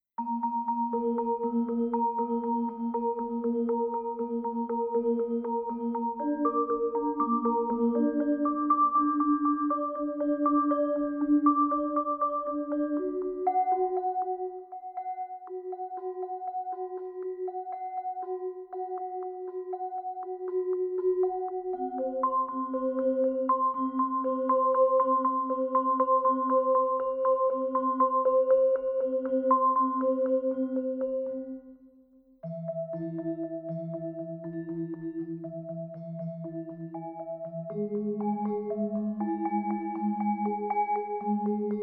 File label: Gamelan LP BP HP; Pokket